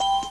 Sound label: Person Leaves Channel; Ircle